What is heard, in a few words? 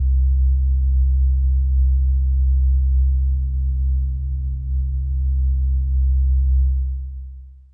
Synth Prophet Bass C; Electronisounds